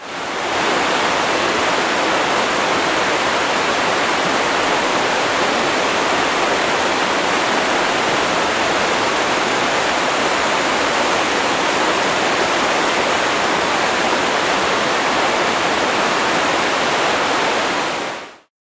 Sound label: Zion River